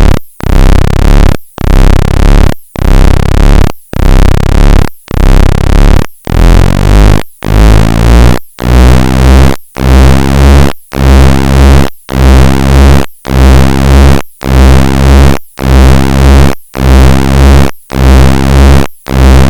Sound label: pwm